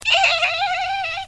dolphin noise

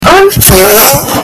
fart explosion

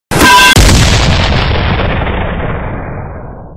explosive dodgeball

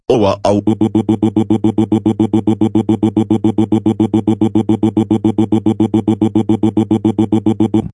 scream; goanimate

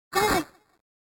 laughs

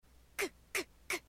muahaha

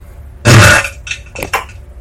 fart

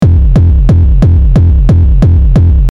bass kick

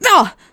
pain

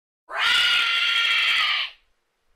reeeeeeeeee

